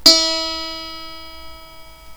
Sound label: board